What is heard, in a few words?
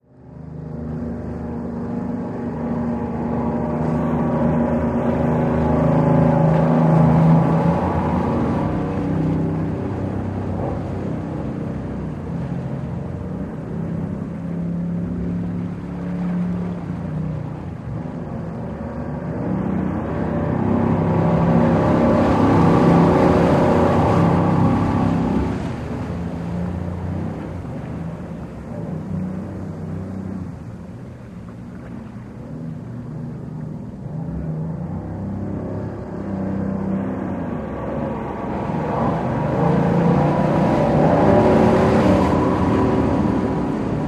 Military Pbr: Circling Offshore Medium Close-up, Slow Speed. Wake Laps On Shore. Stereo